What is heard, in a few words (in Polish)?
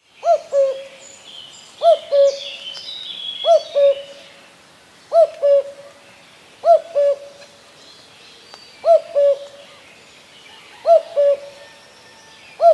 Kukulka Wiosenny Ptakow Spiew